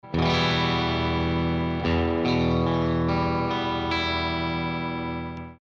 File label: Single Coil Bridge, stratocaster loaded pickguard